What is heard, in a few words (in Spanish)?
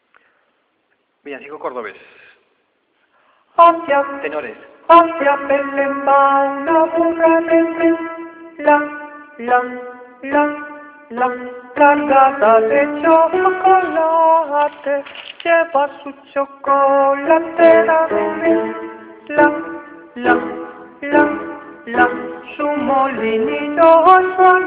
tenores